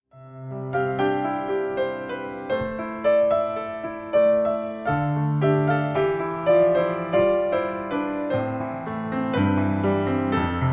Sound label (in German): Piano Meditation